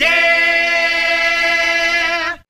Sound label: "yeah" sung